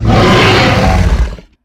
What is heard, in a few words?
Harpooned; Evolve; Behemoth